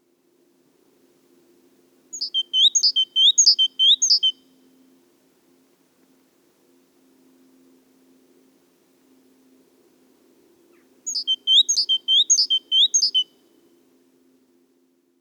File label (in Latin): Parus major; SETE